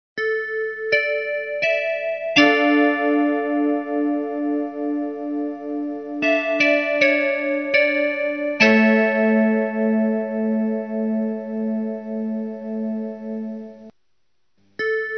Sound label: interval signal; ukr; ivano frankivsk